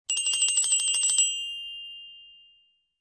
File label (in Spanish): campanas cena